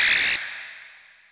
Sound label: Space open program; Microsoft; Windows 98